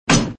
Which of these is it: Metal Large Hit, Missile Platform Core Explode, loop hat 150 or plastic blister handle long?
Metal Large Hit